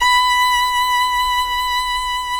FIDDLE NOTES, B5, R

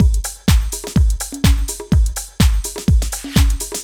DRM, LOOPS 125, House

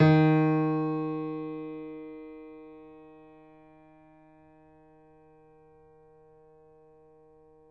piano; East West; The Ultimate Piano Collection

